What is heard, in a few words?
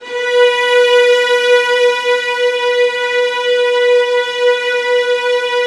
violins C#5; ILIO; Synclavier Strings